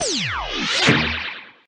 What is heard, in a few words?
attack; dexomon2